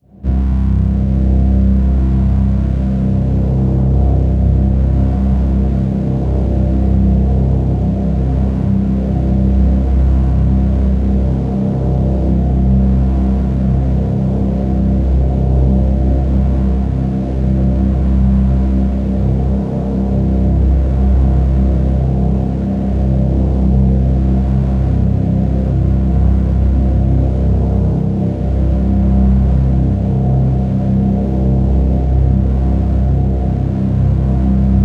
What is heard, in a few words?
Dark Drone Intensive Electric Waves; Dark Drones; Systematic Sound; Tonal Elements Obscurum